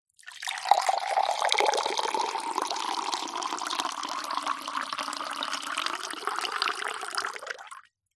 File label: food drink water pour into glass